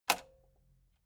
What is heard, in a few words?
metal button spring click fast